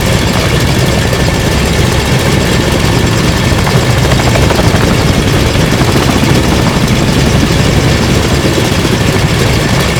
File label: helicopter damaged; veh helicopter damaged